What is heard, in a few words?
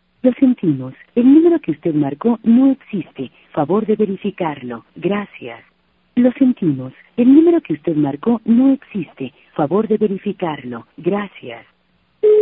mexico, international telephone sounds; mexico cis